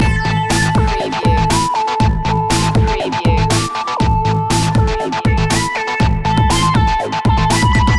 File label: unnatural science loop